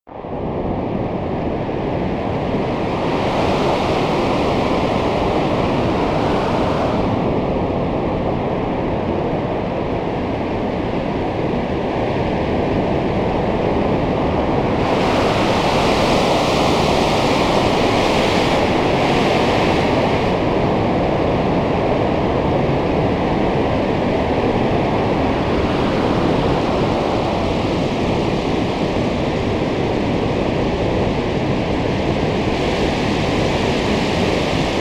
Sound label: wind storm